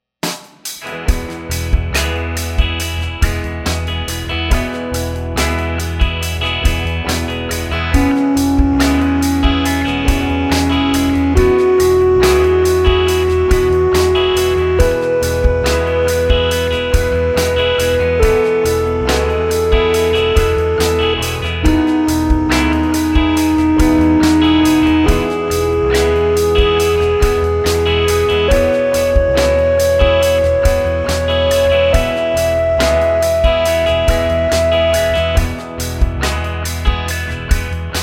scale degree

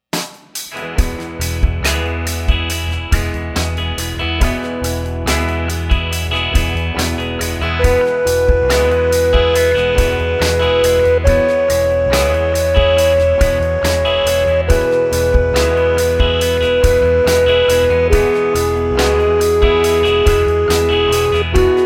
scale degree

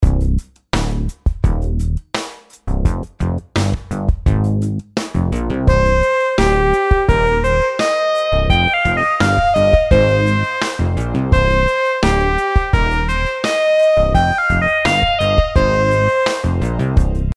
rhythm simple 8s triplets

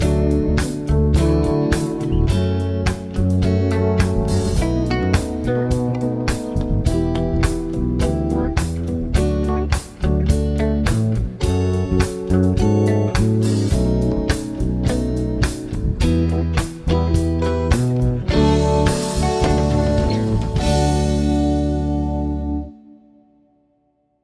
progs with applied chords

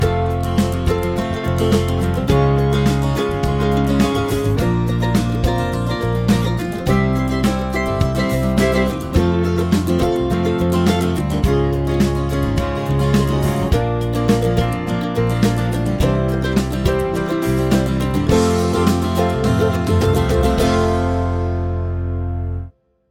chord prog with mixture

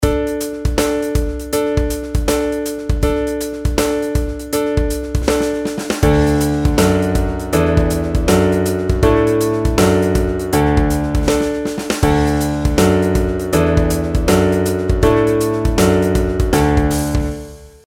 pitch patterns skipwise; melody

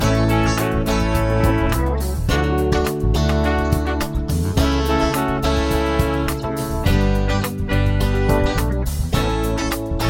chord prog with mixture

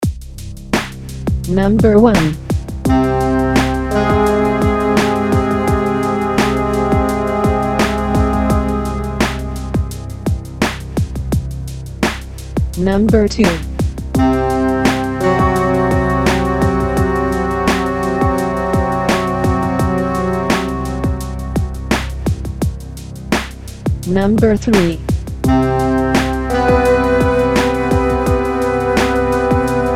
intervals

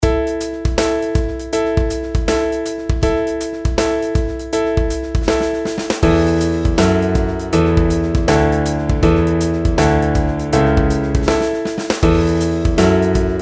pitch patterns stepwise

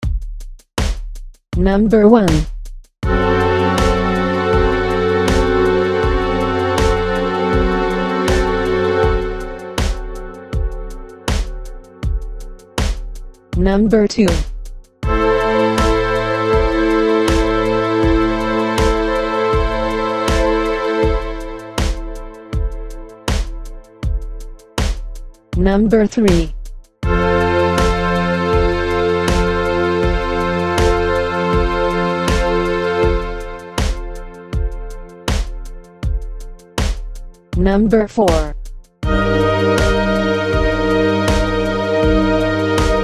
chord quality id

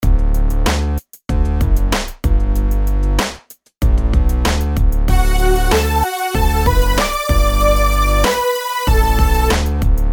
rhythms simple 8s major

